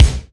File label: BD, BASS DRUMS; EdgeSounds